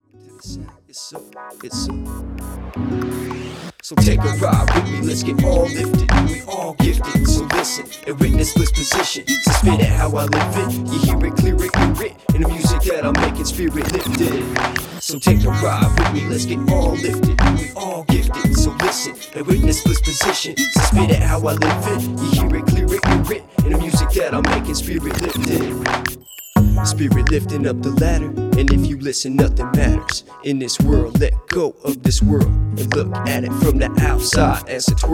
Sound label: Raw Rap